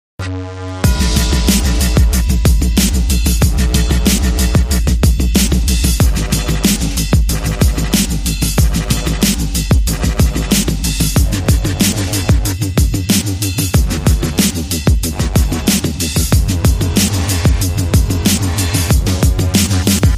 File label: Dramatic String Short